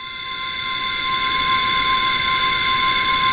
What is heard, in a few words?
THROATY; Pro-Rec - Synthsonic; JV FILM